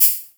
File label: Shaker, Dubstep One-Shot Drums